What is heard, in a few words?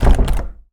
door locked; DeadFright